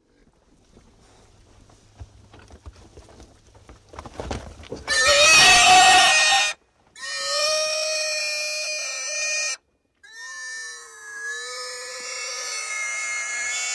Upset Pig Screams Mon

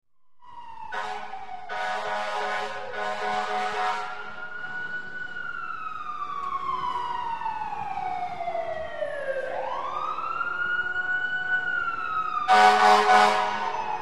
Firetruck Siren Horn

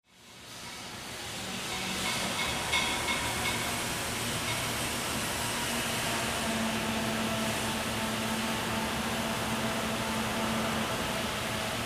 Industrial Factory

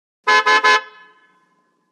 1950 Car Horn Lrge Ame

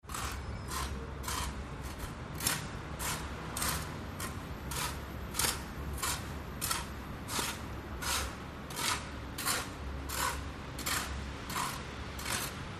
Snow Shovel Scraping

